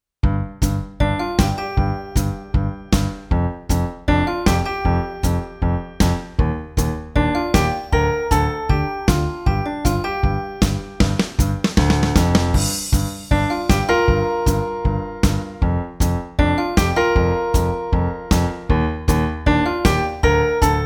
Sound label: Keyboard Adrenaline